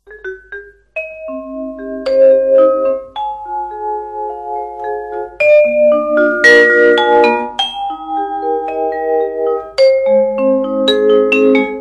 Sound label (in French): Vibraphone Percussions